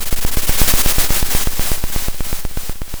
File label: pop, Sound of a drum roll